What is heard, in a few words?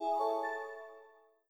Windows Print complete; GMM Windows 10